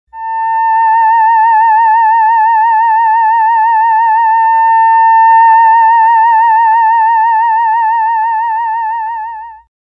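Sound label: theremin note A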